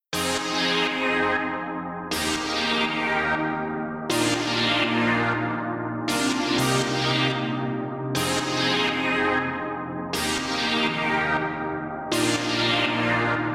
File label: chords piano verb; fabfilter